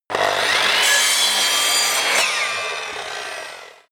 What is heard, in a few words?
table saw on load